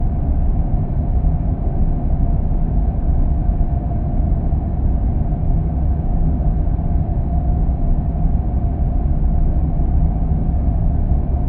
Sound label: Steady Distant Machine Ambience